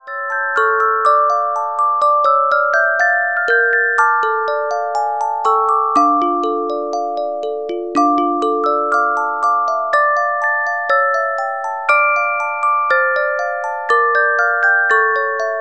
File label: Carillon Music Box Melodies